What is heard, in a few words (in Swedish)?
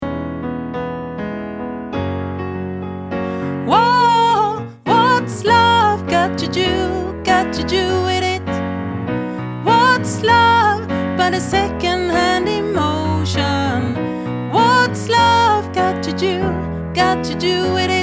sopran